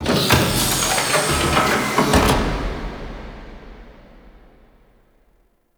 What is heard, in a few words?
Orbiter Fall Pod Open; The War Within